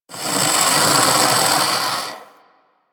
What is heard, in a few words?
Armadillo Vocal Snore; Characters; Warframe; Hunting Critters